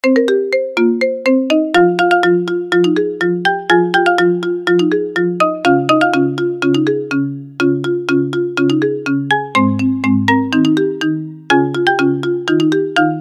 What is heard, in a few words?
marimba ringtone; Dragon Ball Z prologue song; DBZ